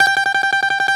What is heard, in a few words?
BALALAIKA, Russian Strings